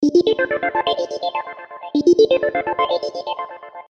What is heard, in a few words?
Synth